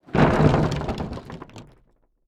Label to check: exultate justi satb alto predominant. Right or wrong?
Wrong